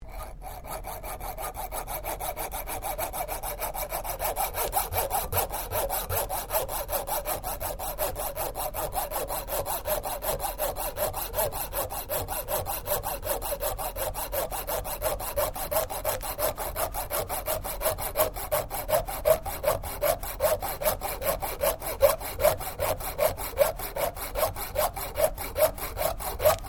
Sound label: SAW COPING SAW CUTTING STEEL; small domestic coping or hack saw; Saw Sound Effects; Machines